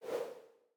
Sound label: navigation transition right; Google; Material Design sounds; Secondary System Sounds